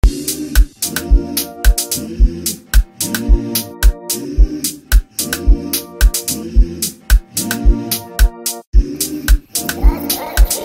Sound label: Amapiano